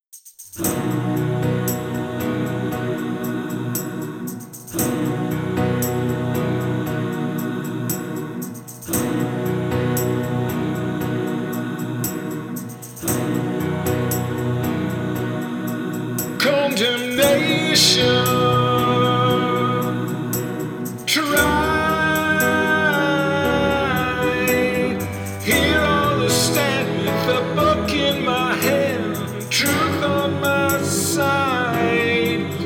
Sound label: Karaoke